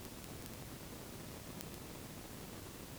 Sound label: PassiveSensor 384kHz, passive acoustic sensor; Alykes